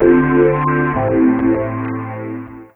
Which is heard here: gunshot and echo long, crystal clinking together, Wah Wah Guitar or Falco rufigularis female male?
Wah Wah Guitar